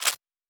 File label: Weapon Foley